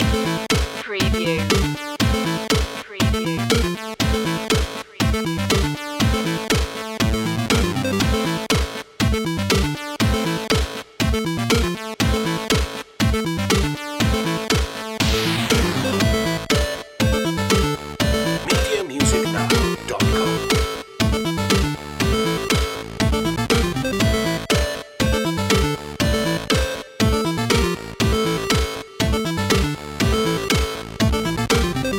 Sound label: retro video game loop